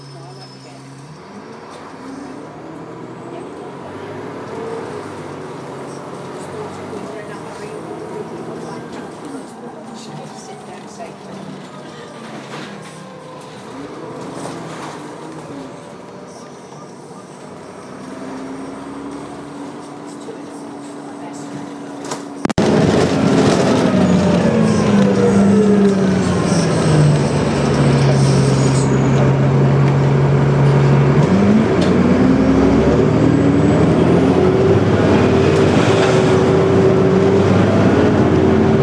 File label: bus ambience including headphone music bus bumping along and very squeaky door